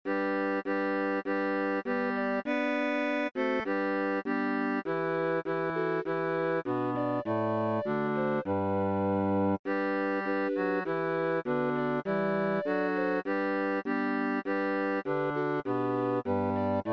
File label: Bass; Angels from the realms of glory